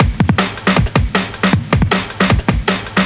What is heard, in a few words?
drums, Hardcore Breakbeat, 157 Dmin